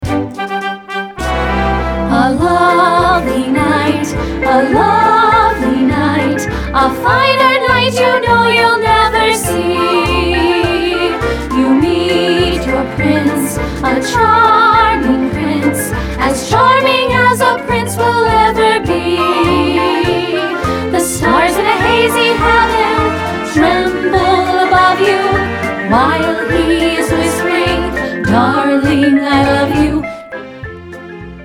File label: VOCAL EXCERPT; audition materials cinderella